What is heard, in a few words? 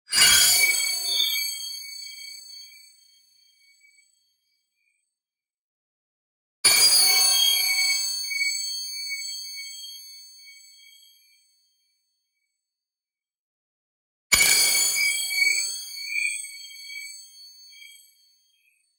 PING Prism Break; boom library; Neon